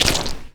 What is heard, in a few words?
fs fm paint, footsteps; Portal 2